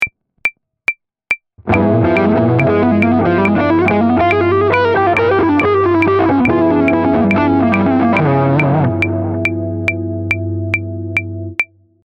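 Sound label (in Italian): Lick 2, SCALA DIMINUITA SEMITONO-TONO lick, Jazz fusion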